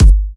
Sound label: zipline, Kicks Electro